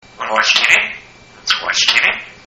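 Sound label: "wanna watch tv lets watch tv", parrot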